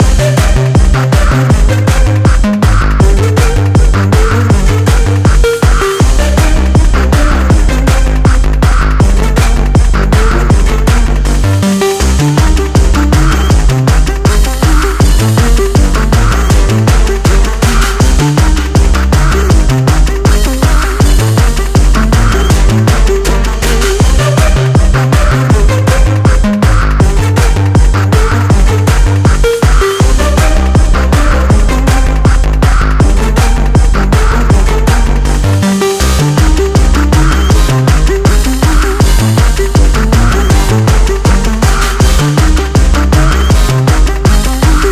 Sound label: Boss floor loop